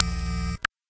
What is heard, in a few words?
warning sfx loop; video games; ambient; launch; source engine; portal2